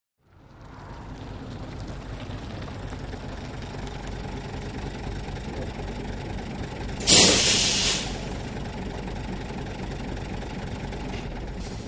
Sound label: Bus Stop Coach Slow Up